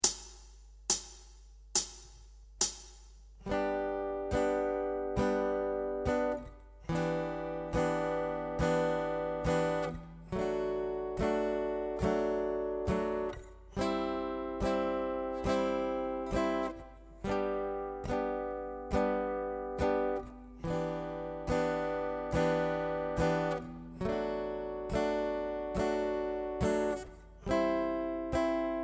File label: Chord Voicings